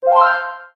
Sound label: correct